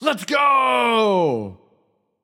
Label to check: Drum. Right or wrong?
Wrong